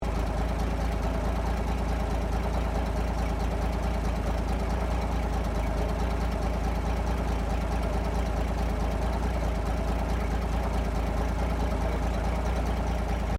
CAR HOLDEN FE IDLE BONNET OPEN LOOP, Classic Australian cars; Vehicles